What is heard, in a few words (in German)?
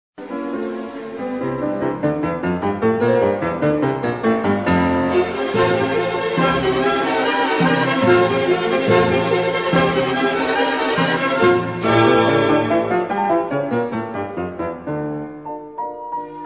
Klavier